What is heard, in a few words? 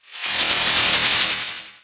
regen